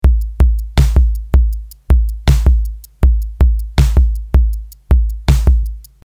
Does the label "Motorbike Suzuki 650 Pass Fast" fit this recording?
No